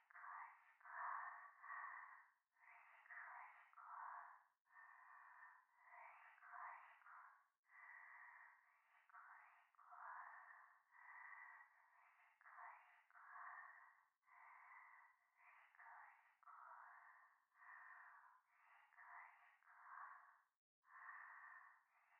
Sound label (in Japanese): しこしこ; 夢の中